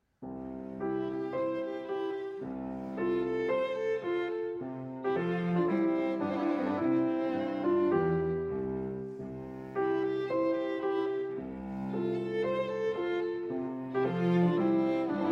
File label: Piano Trio in F Major, Andante; Hob. XV39 Sonata IV II.